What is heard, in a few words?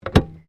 Car Subaru Liberty 2001 exterior petrol cover close; Vehicles; Family members Subaru